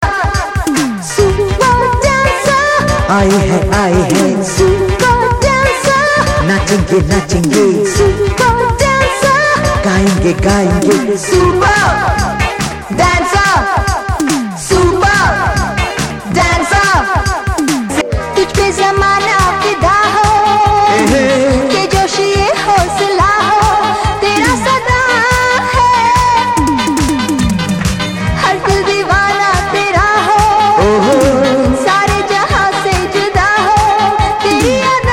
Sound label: Super Dancer dance dance ringtone; Ringtones